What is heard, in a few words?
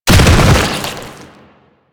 Bodyfall Default; Enemies; Warframe; Proto Infested